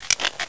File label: ingram clip out twin, weapons